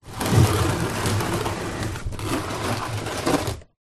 cardboard box tall empty slide garage floor; foley

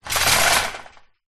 shopping cart metal push on concrete ground short; foley; zapsplat

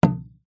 instrument drum gamelan hit single

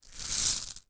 food popcord kernels pour into hand; zapsplat

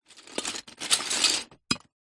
toy lego movement many pieces in box

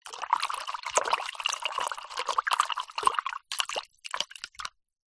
office paint brush artists wash in cup of water

